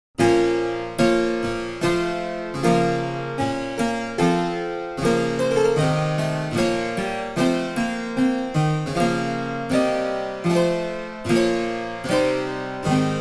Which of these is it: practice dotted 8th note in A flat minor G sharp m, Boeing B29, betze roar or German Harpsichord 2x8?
German Harpsichord 2x8